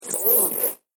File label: Static Blast Data Transmission Radio Tuning; Sound Design; BBFX